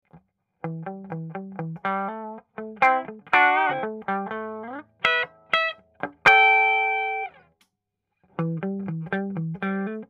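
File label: es335 clean, guitar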